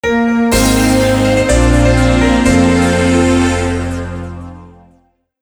New High Score

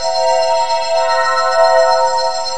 hologram loop; edge extended; android gamerip 2012

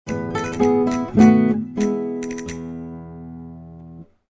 Spain Music Short; Civilization Revolution; xbox

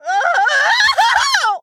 Scream

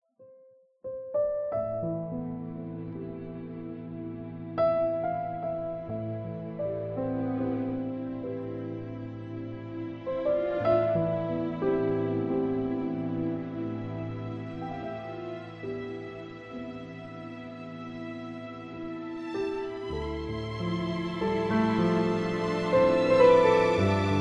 Strings; Stage 04 Promenade

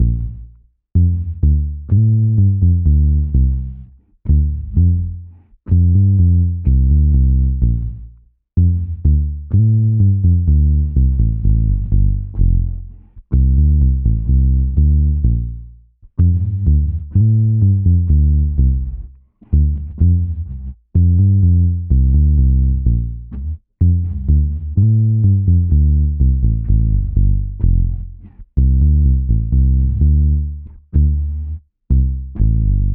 mumble bass (no ambience)

